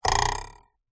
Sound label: cartoon ruler twang metal